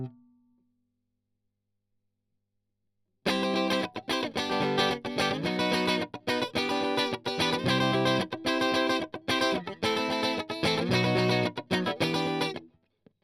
Strum

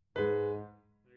Piano A

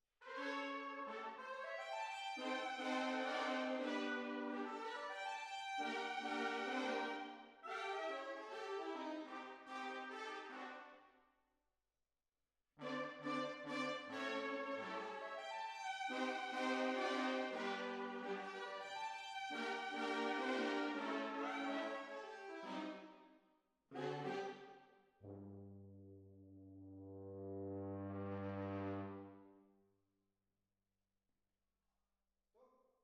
Hall

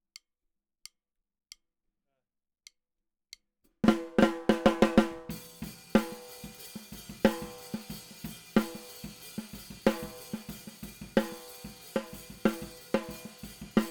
SNR

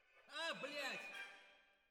Twin F Timp

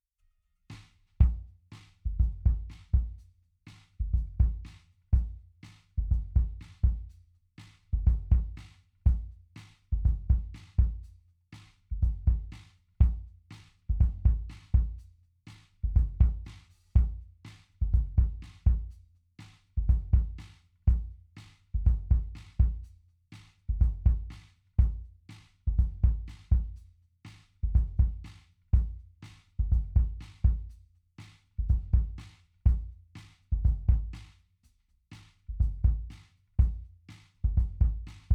Kick

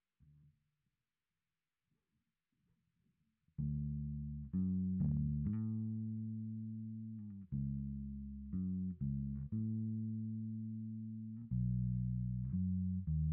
Bass Sansamp

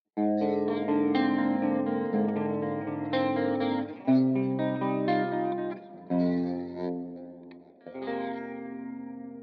Guitar

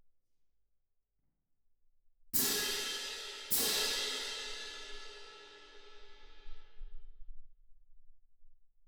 Twin R Perc

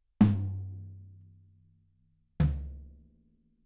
Room A

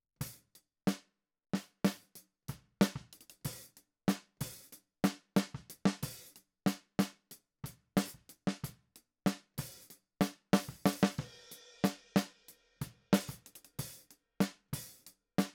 Snare

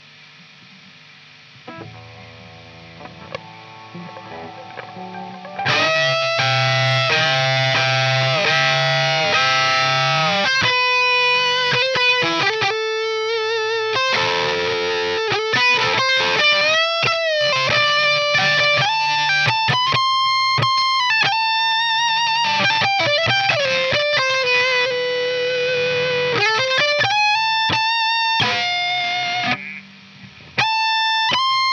Chorus Gtr

